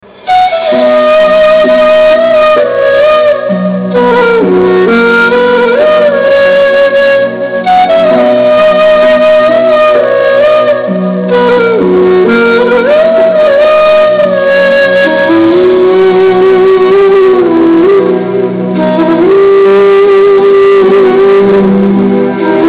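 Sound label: instrumental, krishna ringtone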